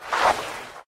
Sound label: Slide; criminality